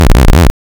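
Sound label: waveform FM sawtooth sine silence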